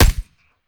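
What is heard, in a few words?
akilo47 suppressor player, weapons; viper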